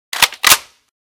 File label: unjam, weapons; FN Fal Reanimation; librarian fal